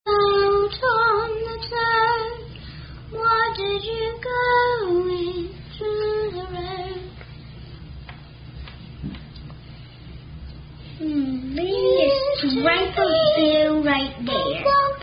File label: Tom The Toad, Children Singing